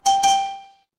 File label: feedback success